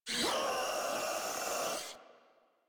Heavy Bark; Enemies; Vocals; Warframe; Proto Infested Ancient

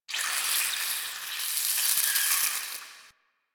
interior vain squeeze; Ambience; Misc Indoor; DE; Warframe; Infested Eris

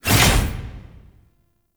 Door Close; Warframe; Jade Shadows; Quests; Escape Cinematic

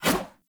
Sword Whoosh

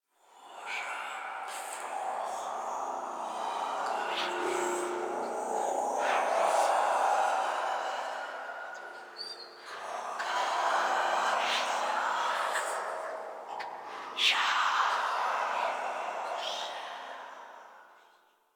Whispers; Ambience; Muruk Vull

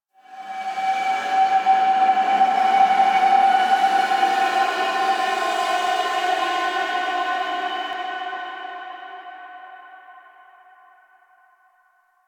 Ambient Texture; Warframe; Ambience; The War Within; Golden Maw

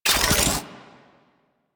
Charge Up, Spear Gun; BE Ferrox

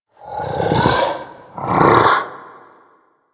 Kubrow Vocal Snore; Warframe; Hunting Critters; Orokin Kubrow

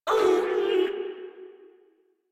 Death Grineer Female, Barks, Battle; Warframe

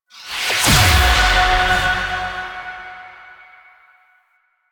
Choir Chorus Cast Power; Warframe

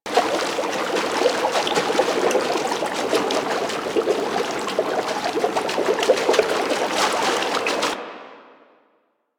Water Tomb Enemy Attach Loop; Warframe; Yareli

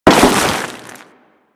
Crewman Land Ice, Footsteps; Warframe; Corpus

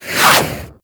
Boomerang Whoosh

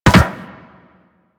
Land Soft Metal, Footsteps; Warframe; Operator

